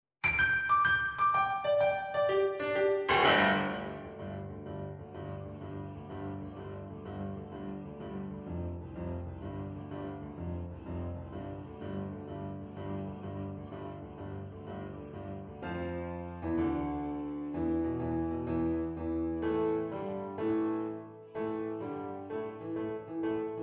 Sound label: Ode piano accomp; wordsworth